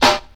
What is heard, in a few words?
1980s hip hop acoustic snare sound g key, snare single hit tuned to